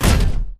Footstep Jog Close; Titanfall 2

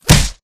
bullet light ballistic flesh; Titanfall 2

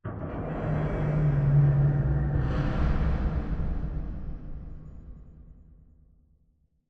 Robot Arm Final Station Down Dist; Boomtown; Titanfall 2

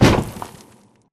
Small Concrete Wall Stop; Boomtown; Titanfall 2

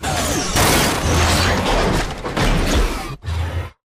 atlas melee pilot extract throw; Titanfall 2

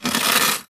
Stalker Damaged Footscrape Long Concrete; Titanfall 2

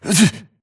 grunt hits; Titanfall 2

